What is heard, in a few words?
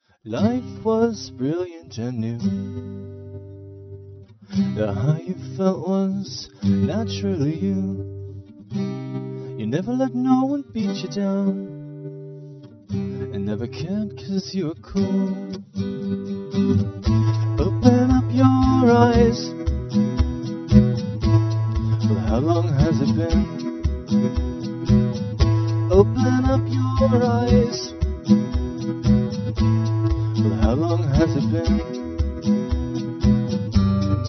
harmonic